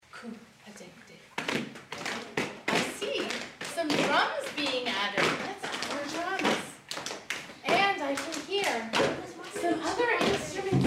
Warrior Dance

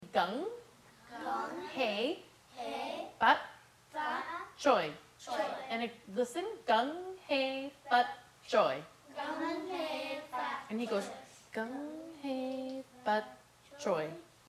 Lyrics Only

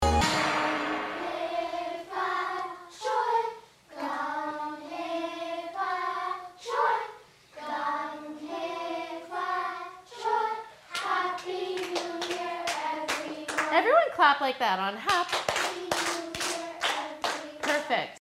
Singing

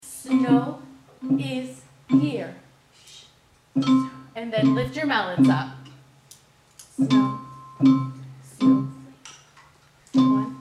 Snow Chant Orchestration

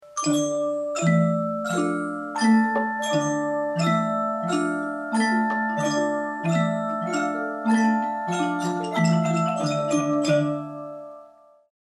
Gung Hay Fat Choy Instruments

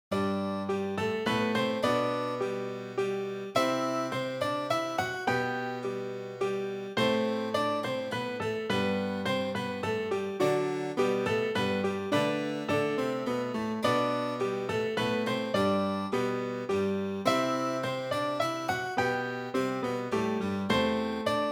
Minuet in G Major inst

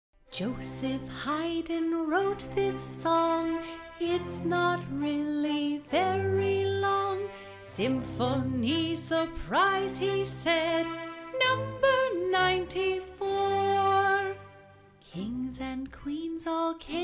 Surprise Symphony Vocal

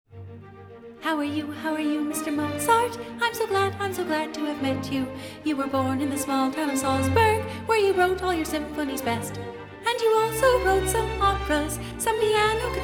Symphony No 40 Vocal

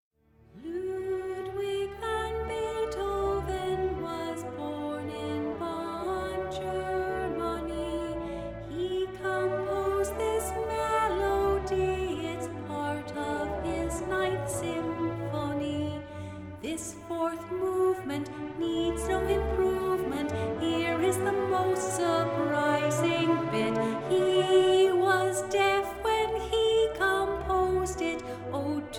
Ode To Joy vocal

